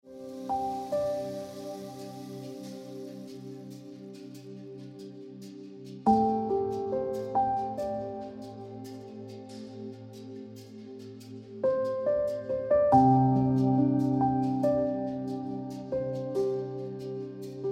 Ringtone

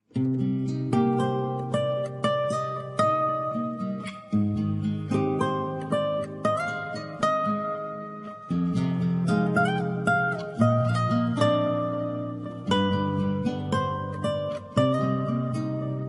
Romantic Pakistani Ringtone

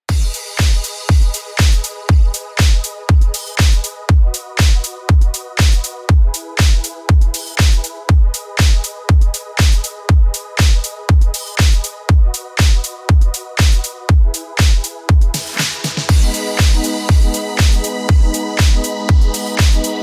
Ringtone Deep House Music

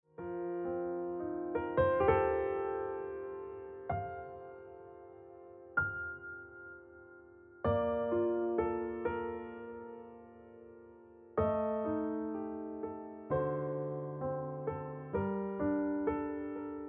Piano Music

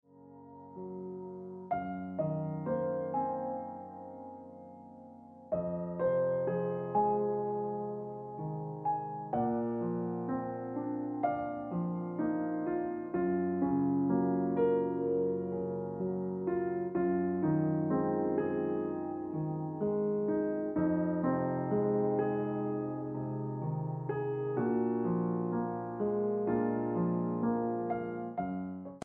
Ringtone Piano Music

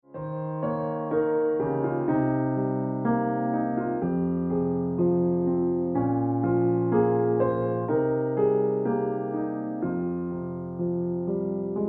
Ringtone Piano Music

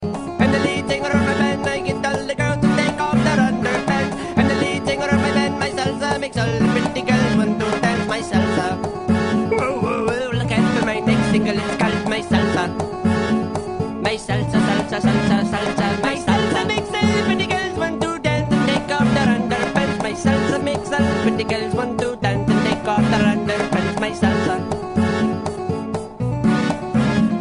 My Salsa Mexican Ringtone

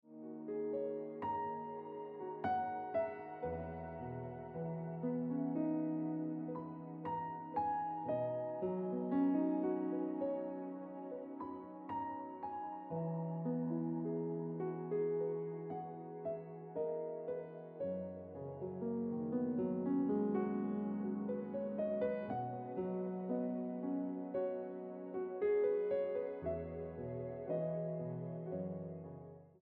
Piano Music

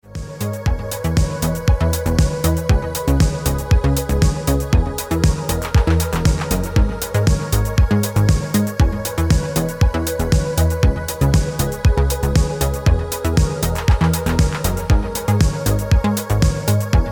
Ringtone

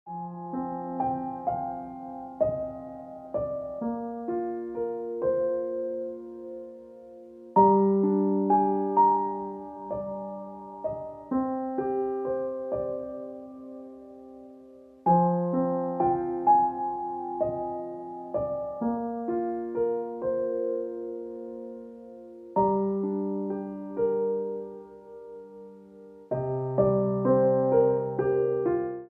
Ringtone

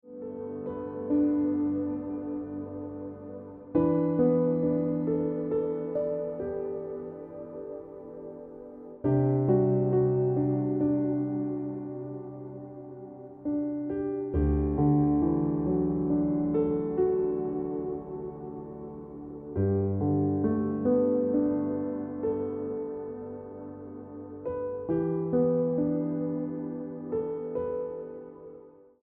Ringtone Piano Music

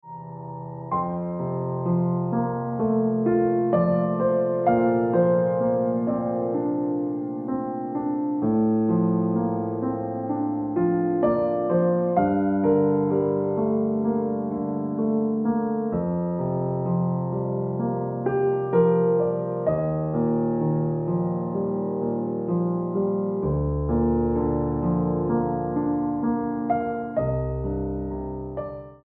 Piano Music

